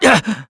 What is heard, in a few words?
Vox Damage, kr; Zafir; kingsraid; voices heroes